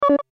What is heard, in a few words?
Multimedia Blip Echo; Technology; Beeps; Blastwave